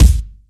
acoustic kick sound g sharp key

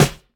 airy acoustic snare c sharp key

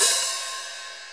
treble heavy open hat sound c key

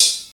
studio closed high hat sound e key

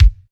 studio bass drum sound g sharp key

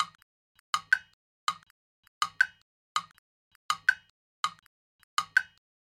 jam block studio sample; percussion loops; resample

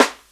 smooth steel snare drum sample g sharp; Duration 0:01; Samplerate 44100 Hz

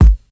original kick drum sound g sharp key

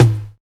low mid tom sample a key, tuned to the A note; tom drum

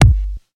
snappy kick single shot G key

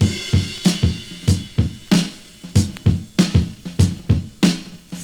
95 bpm drum beat f sharp key

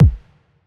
long room reverb kick drum single hit d key

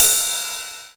cymbal crash sound A Key; cymbal drum samples

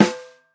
steel snare drum g sharp key

